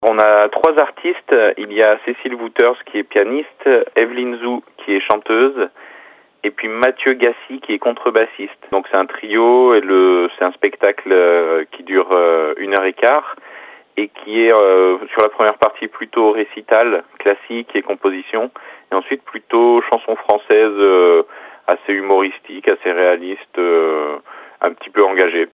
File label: piano